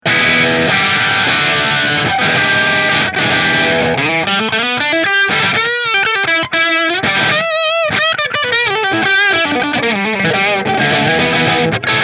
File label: Nola Voodoo Bridge Split Marshall, guitars; New Orleans Guitars Voodoo Custom Natural Finish